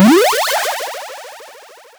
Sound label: 8 bit win sound effect